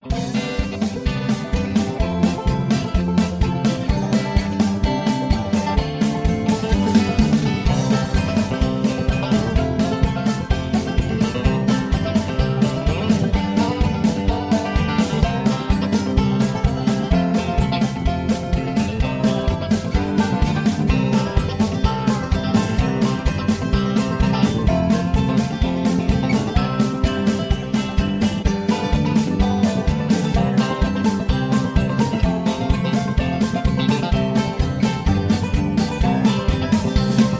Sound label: High Key